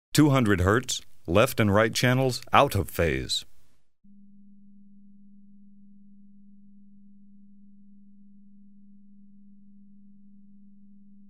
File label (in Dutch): Een sinus van 200 Hz, 180§ uit fase,-10 dB